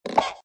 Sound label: GUI stickerbook open